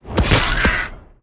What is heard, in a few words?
Mimic Attack